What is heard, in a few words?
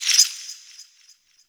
Interface Hover; Warframe; Tau; Somatic Bearer